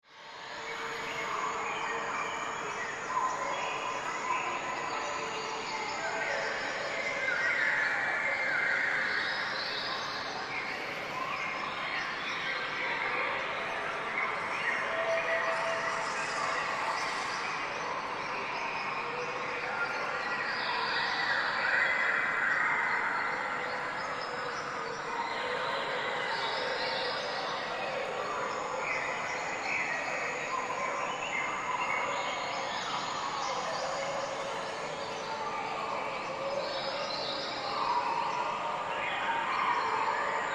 Horror Enchanted Forest Active Birds Critters Ambience; Scary Soundscape